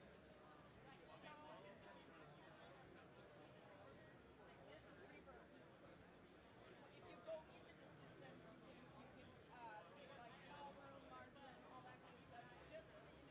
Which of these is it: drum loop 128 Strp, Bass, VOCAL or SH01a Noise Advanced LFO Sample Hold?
VOCAL